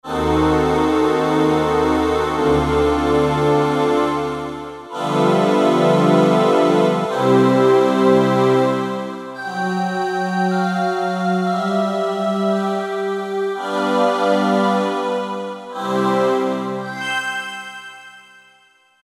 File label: syn, E-mu Proteus FX; Factory Presets; LunaWind